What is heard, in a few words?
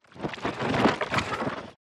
xen infest plant stalk; infestation; Half Life Alyx